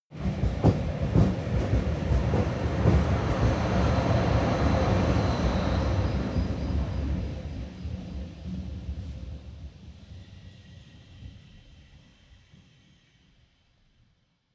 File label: train locomotive drive by